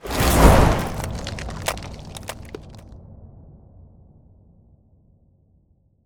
Ice Spell Whoosh, Cold Whooshes; Arctic Aura; Gale

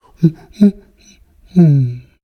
Monkey Lose; Pitch Black Audio Pong

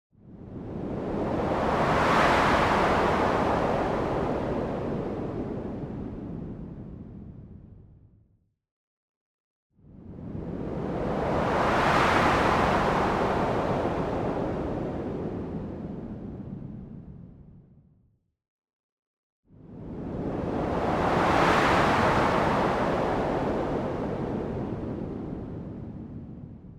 MOVEMENT LONG White Noise Plain; boom library; Anime Essentials

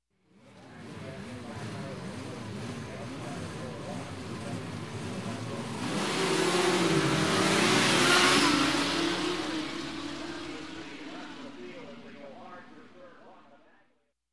Motorcycle, Race Production Bikes Start Of Race; announcer; Sound Ideas; Series 2000

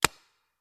Paintball Gun; Sound Ideas; Guns

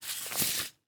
Latex Glove; Medical; Blastwave

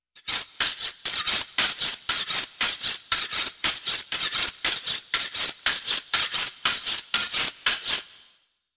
Sharpening Knife; Halloween Havoc - Sound Effects; Ktel

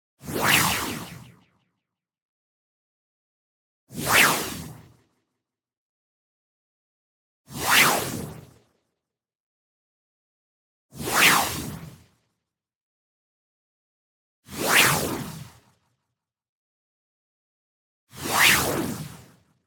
WHOOSH Modulated Noise Delay; boom library; Anime Essentials

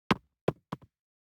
DICE on Cardboard, Throw and Roll, Foam, One Die; Samples; ArticulatedSounds; DICE Sfx Party (Extended)

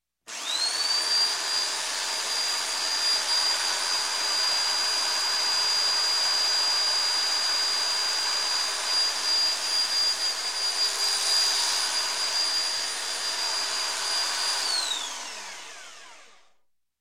Construction, Sander Start, Run, Stop; Sound Ideas 2000 Series

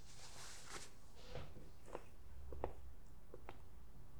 stand up; Apotheorasis

